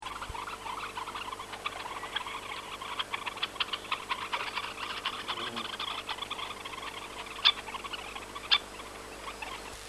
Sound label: Phalaropus lobatus